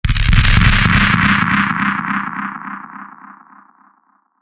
Hit Slow Blast Delay Swell; Blastwave

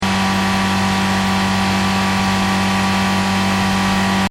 Engine: High Revs Loop, car; Cars; Vehicles; Stuart Duffield

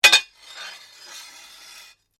Blade Lock Scrape, Sword; Foley sounds for melee weapons; Swords; Combat

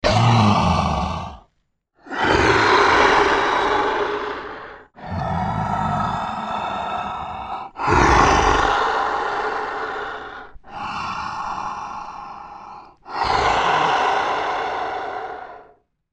Monster Giant Sleeping Breathe Deep Creature; Animal; Monsters; Blastwave

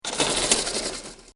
Shade-Window, Open And Close; Household; Windows; Bobby Cole

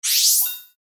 FLASH SHIMMER BLIP; High-Tech; Multimedia; Blastwave

